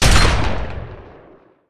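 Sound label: Weapon shotgun combat fire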